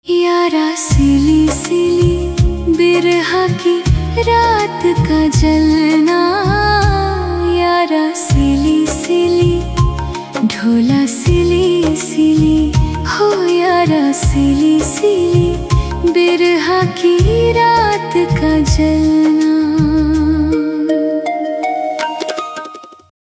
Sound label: Female Version AI Ringtone